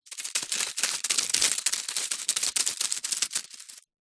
animals insect spider scuttle undergrowth leaves dirt